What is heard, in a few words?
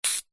leisure can silly string spray short